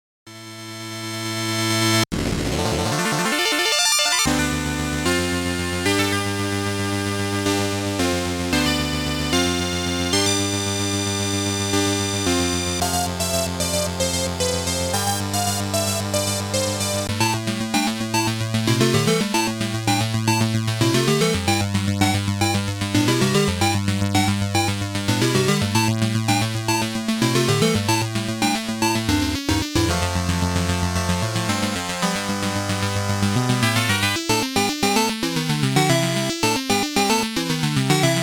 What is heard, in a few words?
PC Engine Chip Shooters